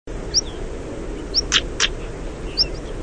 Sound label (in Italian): saltimpalo, canti, animali